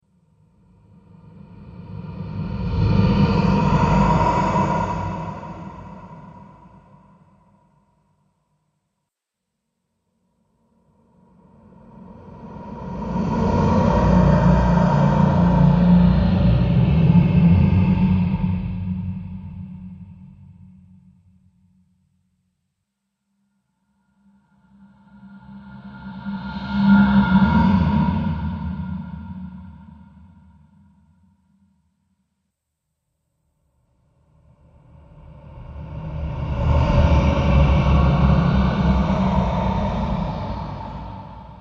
Ghost Phantom Horror; Ghost Sound Effects

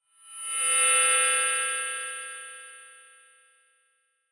Digital Feedback, Scifi; Scifi Production Elements, Set 1; Lynne Music

